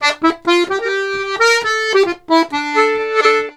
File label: Accordions; USB Soundscan vol.40; AKAI